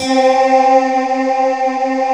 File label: C4, Choir Acoustic & Synth; USB Soundscan vol.28 - Choir Acoustic & Synth; AKAI